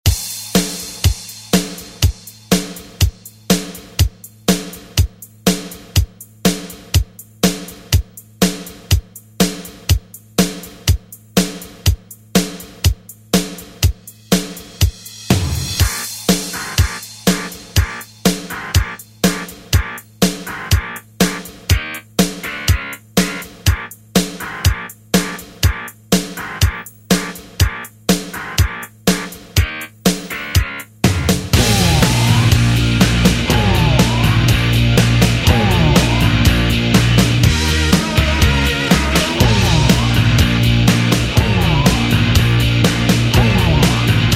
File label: 90s ReDrum; DJ Mhark